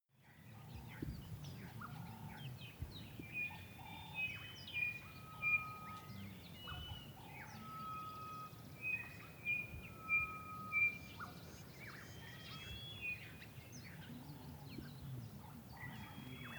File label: african bush midmorning birds